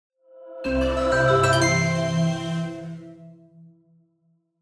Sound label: Unlock Achievement Sound; Stabfish